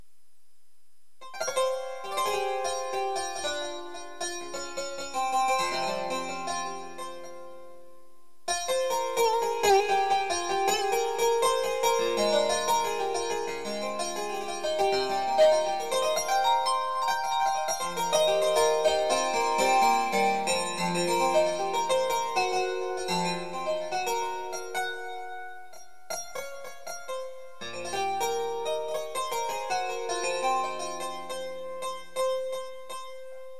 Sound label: Santur